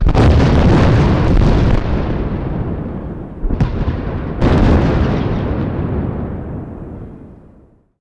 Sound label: station explode; SpaceFlight; XCOM